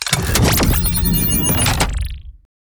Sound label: Reload Large Laser Power Injection